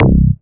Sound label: BASS MONO